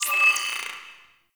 Teacher Appear; Ceph; Warframe; The New War; Zariman Class Quiz

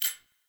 Menu Hover Powers, UI; Warframe; Awakening Remaster

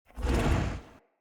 Stag Breath; Garren Boss Arena

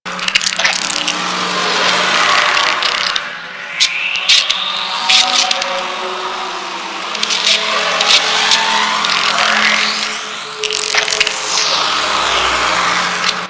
Corruption Pick Up Hostile Small Loop; Warframe; Missions; Zariman

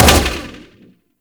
Grenade Bounce, Weapons; Warframe; Corpus

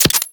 Pistol Projectile Attach; Guandao

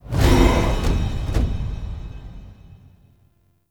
Rally End; Warframe; Hoplite

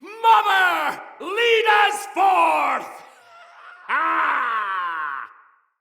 Cry, Soldier Male, Battle; Dendrit

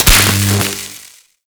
Mining Laser Fire; Eidolon Plains; Eidolon Mining Lasers; Weapons

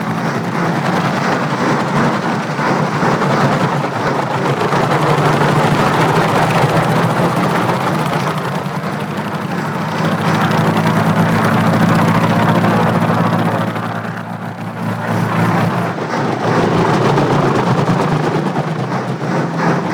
Crew Ship Engine Loop; Warframe; Vehicles; RailJack; Crew Ships; Grineer Crew Ship

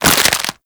Stealth Neck Snap; Warframe; Characters; Tenno

